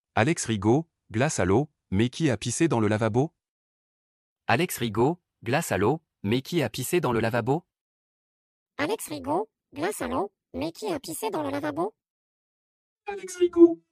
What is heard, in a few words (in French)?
glace eau lavabo; alex rigaud